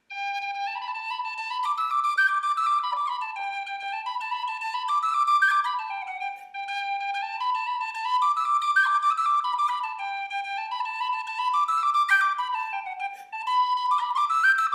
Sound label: The Kesh Jig